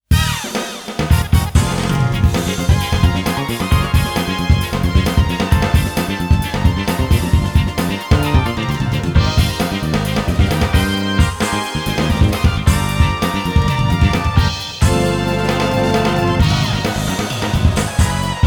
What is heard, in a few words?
Best Goal Replay BGM